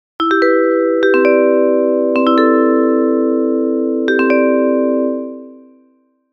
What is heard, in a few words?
midi delay 11msec; poppo-ya